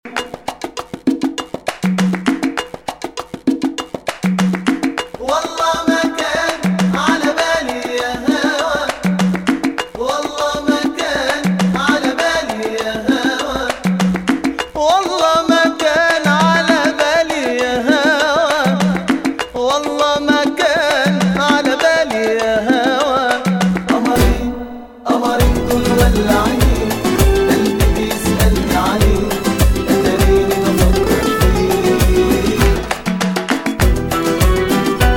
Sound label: Arabic Groove